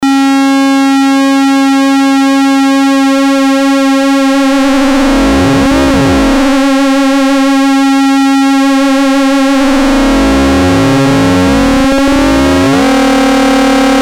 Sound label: pwm, VCO rectangle width; MFB nanozwerg; test: VCO rectangle width